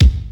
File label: present kick single shot C key